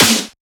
Snare Clap; FLPRO

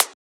Closed Hat

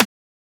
Transient; FLPRO

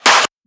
Shifted Clap; FLPRO

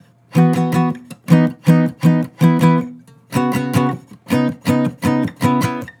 Acoustic Chord Loop - 160 BPM E Maj; Cymatics

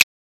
Transient; FLPRO

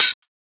Open Hat; FLPRO

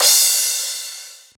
Crash

